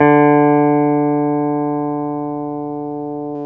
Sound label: GUI SUS D 2, E-Guitar; E-GUITARS